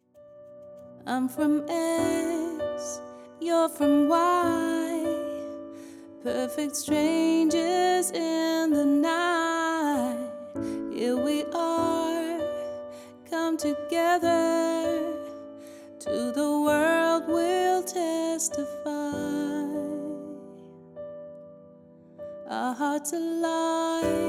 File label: acoustic